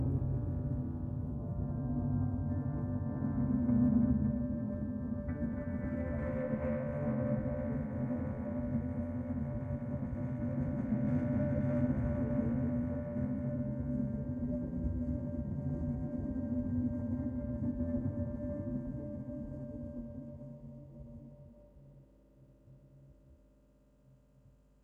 ambient nature

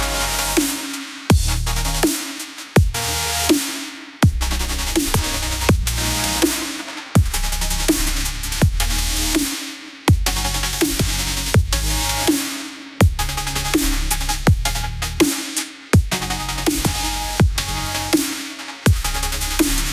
ringtone edm

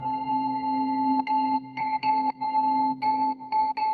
notification

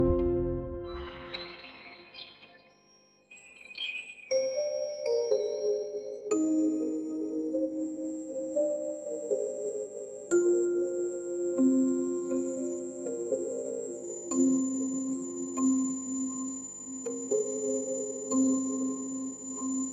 alarm; ringtone

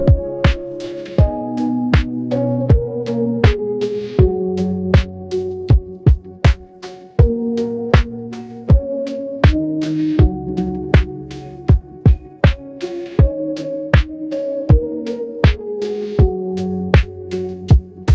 ringtone lofi

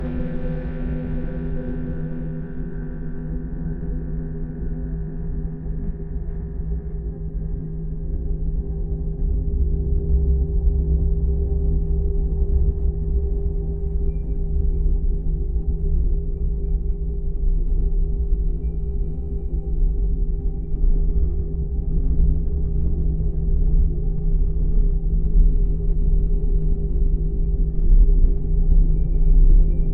ambient space

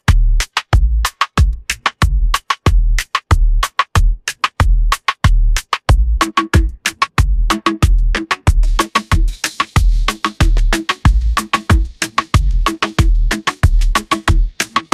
ringtone reggaeton

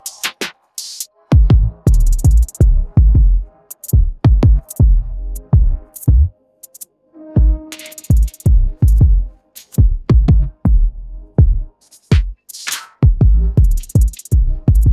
ringtone hiphop

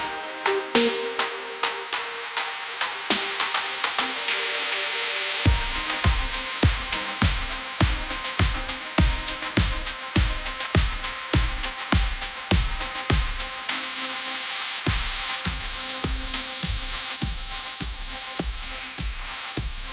ringtone kpop

